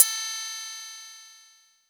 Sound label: METAL Pinggg, perc